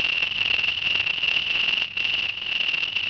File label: cricket; Pirates Of The Caribbean